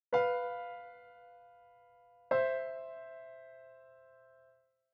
dominant motion

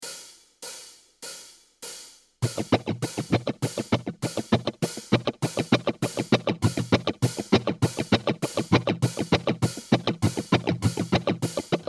strumming cutting basic

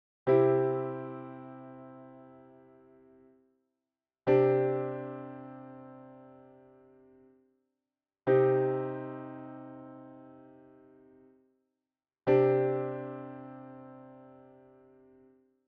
c csus2 cm csus2

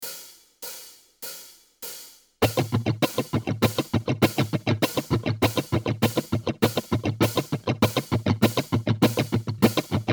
strumming cutting basic good example

